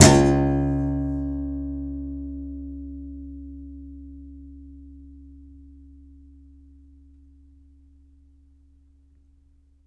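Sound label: contrabass balalaika, Plucked Strings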